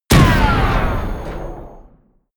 sci-fi plasma cannon explode

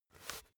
basketball turn swipe short quick

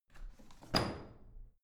washing machine drawer in squeaky

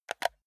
console controller home press fast

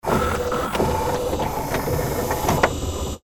machine scream

